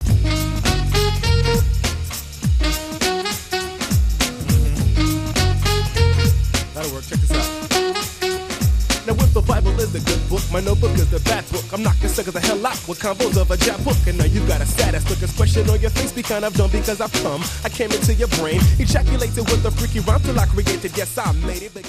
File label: sax